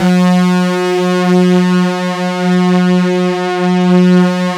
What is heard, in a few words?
SYNC DEEP, PADS; Masterbits; Vintage Voltage